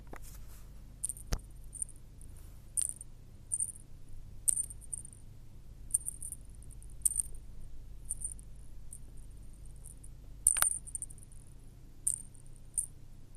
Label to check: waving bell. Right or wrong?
Right